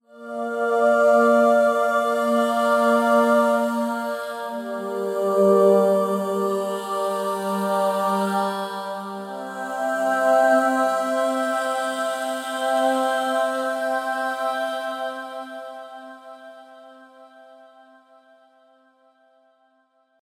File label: Vocalise Performance Palettes